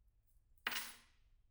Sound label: keys; IEEE AASP